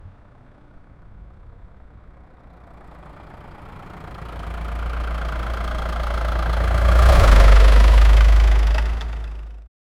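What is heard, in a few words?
closing of a truck tailgate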